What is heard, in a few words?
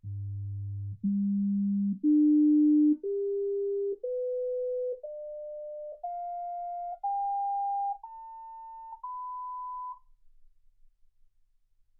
sinus tones 48kHz